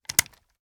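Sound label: weapon assault rifle selector off; weapons; gmod; viper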